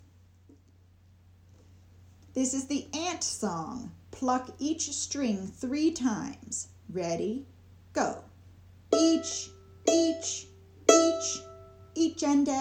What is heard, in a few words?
Ant Song